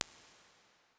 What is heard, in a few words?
Hall, Piano Reverbs; Convolution; IR Samples; Masterverb; Kontakt 5